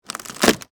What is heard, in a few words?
Impact Wood Crack, Splinter; Materials; Blast Wave